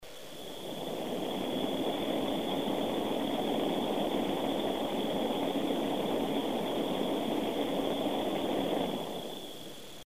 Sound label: coolage f0z924al hi